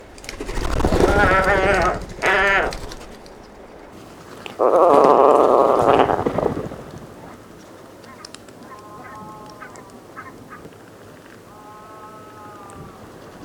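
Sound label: puffin; gasoyane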